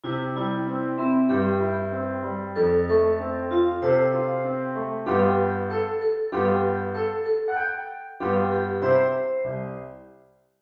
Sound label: melody